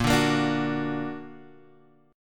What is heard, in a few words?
Bb Augmented, Guitar, Standard; strummed; 6,5,8,7,7,x; JGuitar